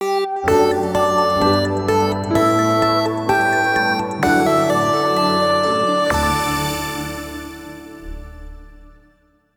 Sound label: Jingle All Stages Cleared!